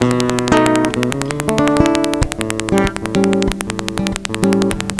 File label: Nylon, Cool Licks; Sound Factory